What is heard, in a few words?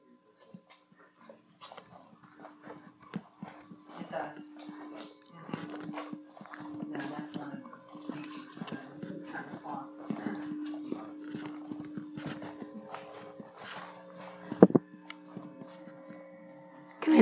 Train Station Phasma Box; Chessies Room; Mars Train Station – Return Investigation 2024